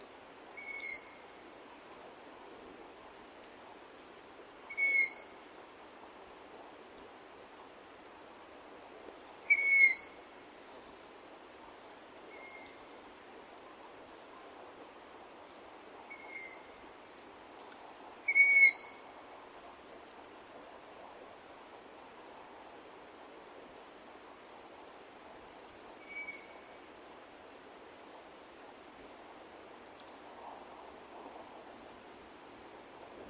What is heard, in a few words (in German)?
Eulenkuekenruf